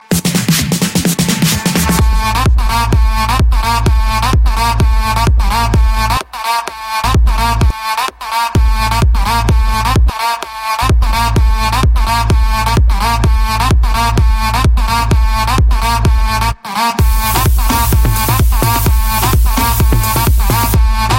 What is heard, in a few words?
dirty electro 134